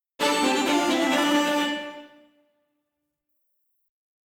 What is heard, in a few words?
Combat Out; Skellige